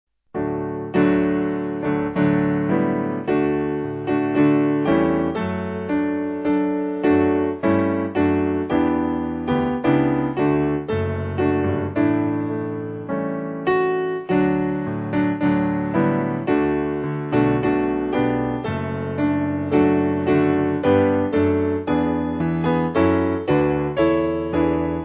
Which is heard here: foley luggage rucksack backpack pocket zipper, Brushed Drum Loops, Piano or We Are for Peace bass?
Piano